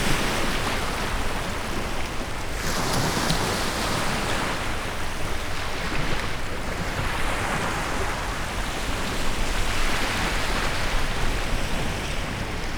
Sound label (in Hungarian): szürkületkor a partról